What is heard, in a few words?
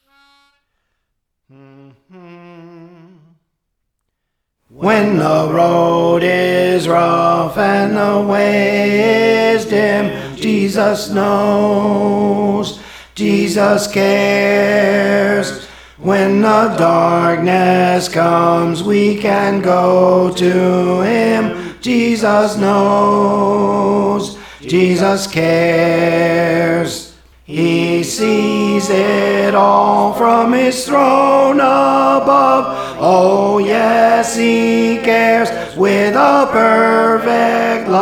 Tenor; Praise For The Lord Training; Jesus Knows and Cares